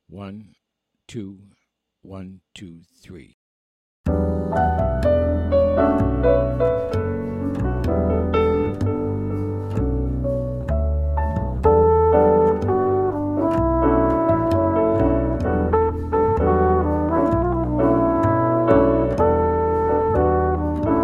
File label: minus drums